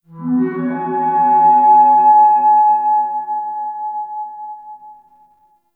Cascading Fifths Flower Structure

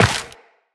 Step Dirt, Footsteps, Walk; Characters; Tenno

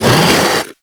Brawler Rock Punch Cast; Warframe; Warframes

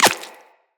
Bullet Impact Flesh; Weapons; Bullet Impacts

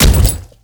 Ghoul Devourer Step Default, Footsteps

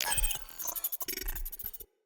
UI Intrinsics Charge Start; Railjack Player Ship

